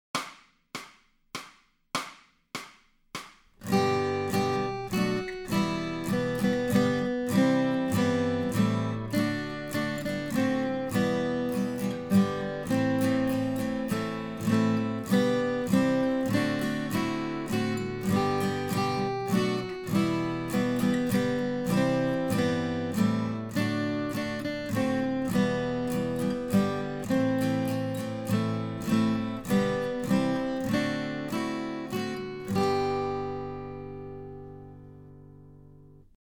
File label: acoustic guitar, accompaniment; Grade 8